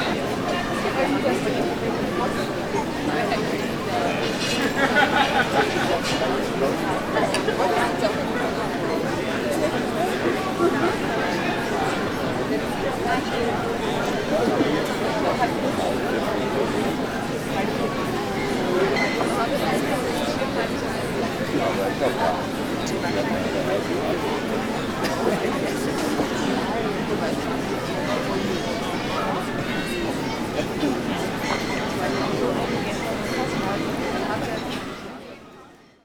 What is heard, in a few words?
beer garden, surroundings; best service Blue Box